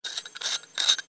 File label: turn in lamp